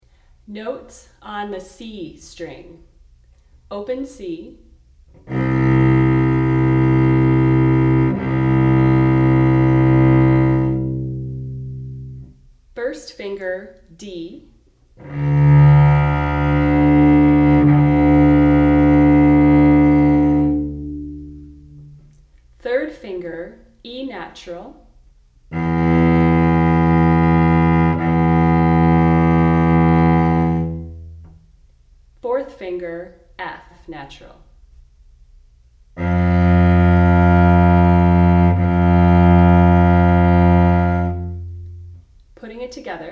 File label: Notes C String, cello; Notes on the C String